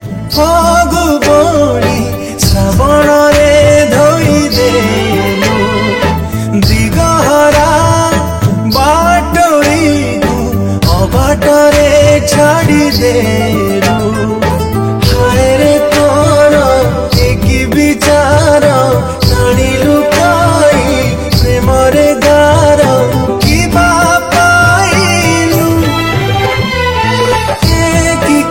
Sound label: Odia Sad Song